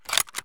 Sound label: mosin bolt; Escape from Tarkov